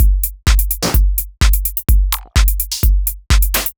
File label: 127bpm, House Drumloops; Zero-G House Fabrik Drumloops; ZG Drumloops